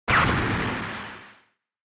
Explosion Short

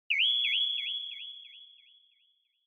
Onoma Flash Low Delay; オノマトペ 光る05-7(低 ディレイ); 効果音＞オノマトペ・光る

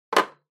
Hourglass Put; タイマー機器

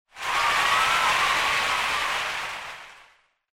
Cheer Crowd, High Short

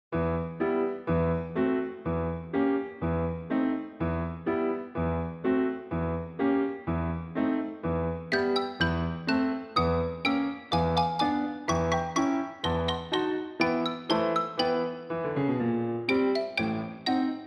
Dance Of The Sugarplum Fairy Fast

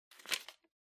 Calendar Rustle

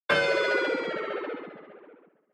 Onoma Negative Long; オノマトペ ネガティブ; 長

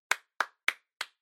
Applause Random Short Slow

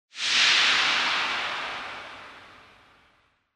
Noise Sweep Low Long

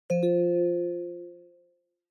OS Sound Notification

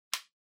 Power Strip Hand Switch

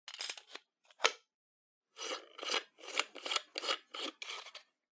Spice Mill (Close)

